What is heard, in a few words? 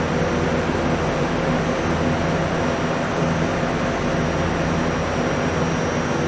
pump loop; gmod